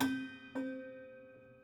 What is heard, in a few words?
Prepared Piano